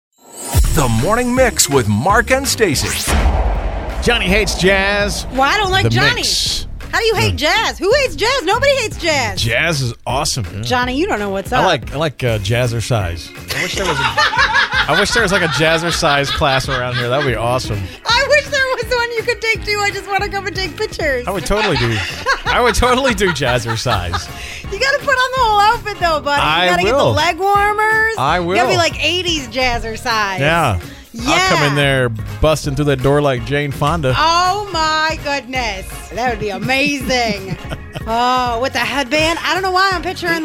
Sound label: Jazzerise